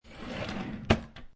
Desk Drawer Close, Wood drawer in office desk being closed.; Drawers; Household